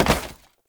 Step Wood, Foley; Warframe; Oraxia

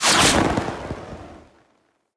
Blind Arrow Whiz By; Powers; Fey; Saboteur

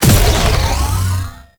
Infested Lich Mindhack Cast; Warframe; Abilities; Zeke

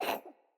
Kitten Cough Hairball; Enemies; Catbrow

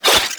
Rising Blades Whoosh; Warframe; Pax Duviricus

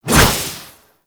Deco Hover Whizzby; Envy; Warframe; Warframes; Pax Duviricus

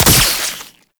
Proj Explosion; Weapons; Warframe; Tenno; Oraxia

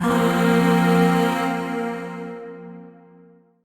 Shawzin Blues Chord C; Warframe; Zariman; Void Shawzin; Chords

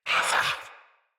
Pain Knight Male; Battle; Barks; Dialog; Mendicant

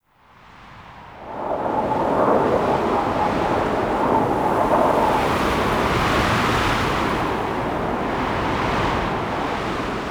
Exterior Wind Gust; Duviri

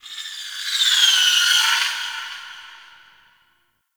Sentient Lair Exterior Creature

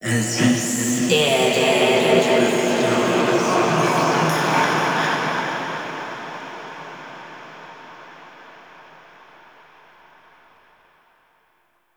Golden Maw Queen Cave Echoes One; Warframe; The War Within

